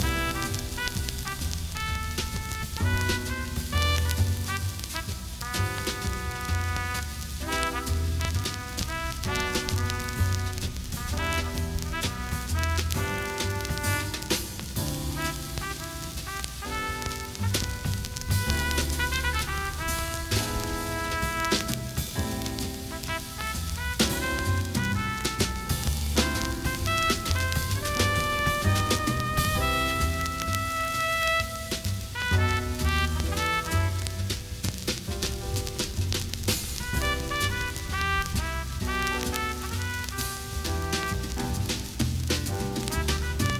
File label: radio jazz; teardown; ps5 windows xbox series xs